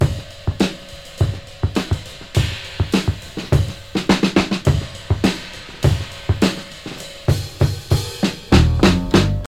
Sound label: 103 bpm drum beat f sharp key, Free drum loop sample - kick tuned to the F# note. Loudest frequency: 959Hz